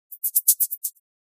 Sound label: spin